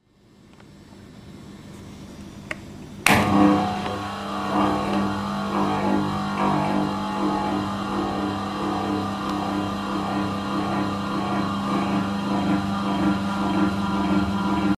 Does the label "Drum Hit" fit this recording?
No